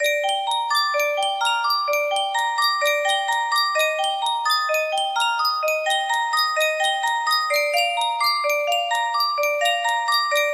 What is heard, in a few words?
music box, music box melody